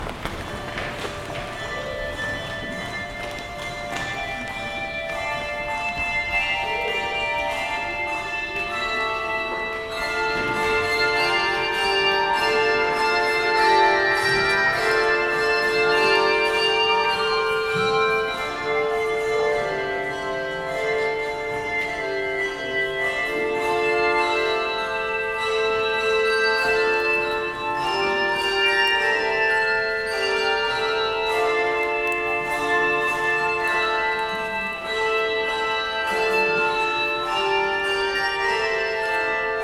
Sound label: Handbells; Thy Strong Word